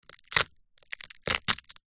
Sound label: crackers squash crack break crush